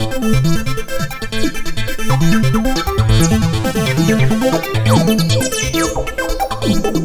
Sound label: Synth